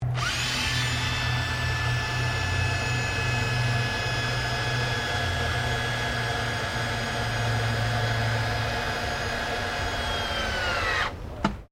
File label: POWER TOOL CORDLESS DRILL SCREWDRIVER; Various power tools